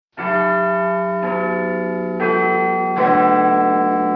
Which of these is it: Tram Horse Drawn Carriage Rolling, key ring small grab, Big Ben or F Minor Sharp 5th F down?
Big Ben